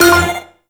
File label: Moto Race Countdown; Warframe; Motorcycle Racing